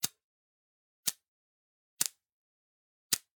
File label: Generic Click Metal Tiny; boom library; The Interface